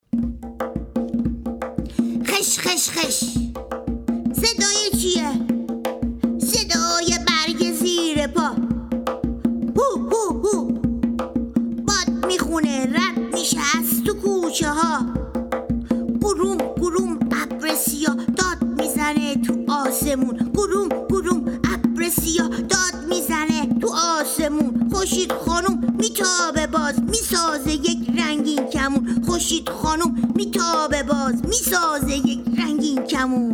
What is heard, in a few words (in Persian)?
رنگین کمان